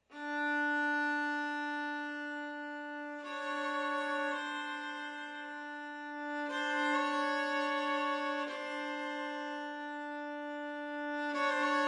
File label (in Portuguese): toada; Paulinyi